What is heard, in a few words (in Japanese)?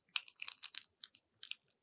くちゅ音, ASMR